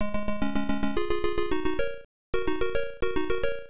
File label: Unused Music, arcade; Scramble